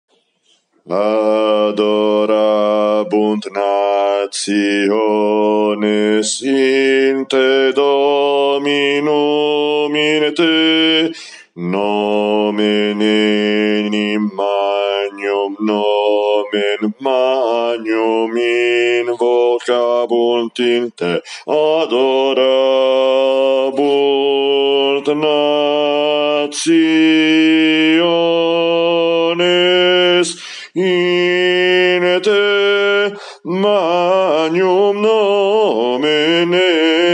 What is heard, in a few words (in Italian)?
bassi; Adorabunt